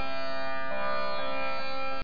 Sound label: Tambura